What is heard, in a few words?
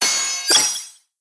UI Unlock; blue archive